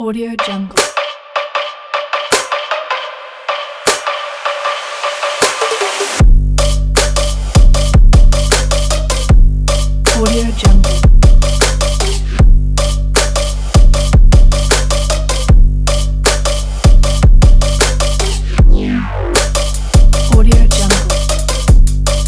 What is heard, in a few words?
sport beat trap edm